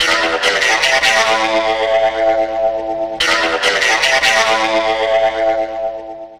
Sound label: Twang Bar 150 A, Guitars; Total Drum&Bass